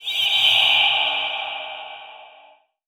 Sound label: Magic, 8 bits; Game Sound Solutions - 8 bits Elements; Magic Demo